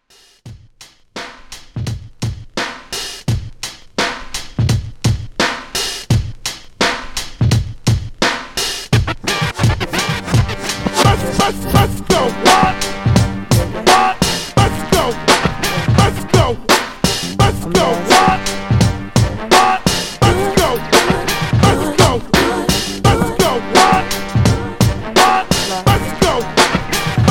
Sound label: 90s Hip Hop ReDrum 85Bpm